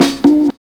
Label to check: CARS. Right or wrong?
Wrong